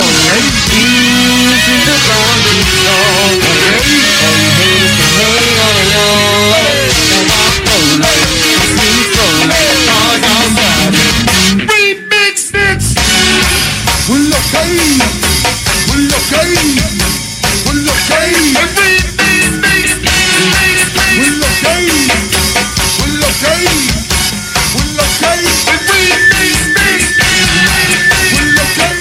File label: Bounce